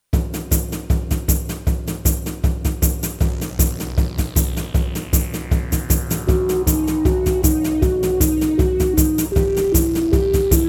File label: Bottleneck Prelude